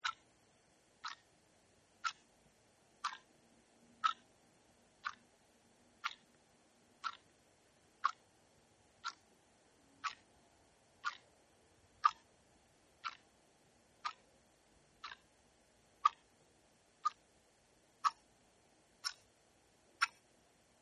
Tabletop Clock, Small Tabletop Clock Tick Tock Loop; Machines